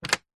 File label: household telephone corded plastic receiver pick up; zapsplat